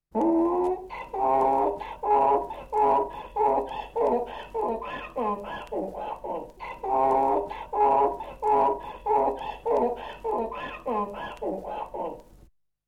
RAGLIO ASINO

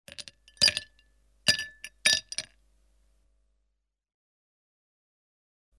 GHIACCIO NEL BICCHIERE

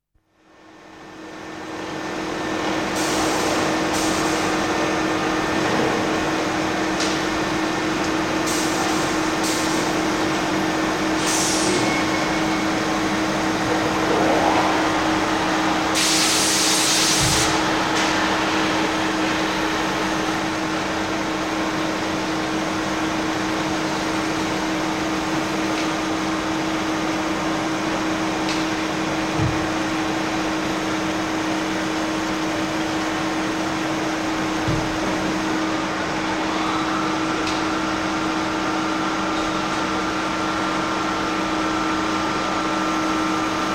OFFICINA FABBRICA

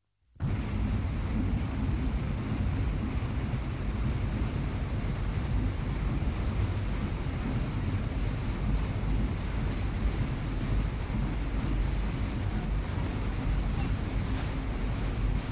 NAVE IN CROCIERA MARE

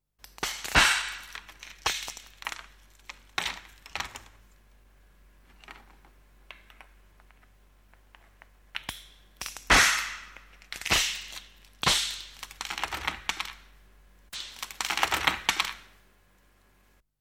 LEGNA SPEZZATA

